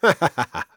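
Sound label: laugh short, German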